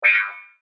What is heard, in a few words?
Ion Laser Stick, laser gun sound effects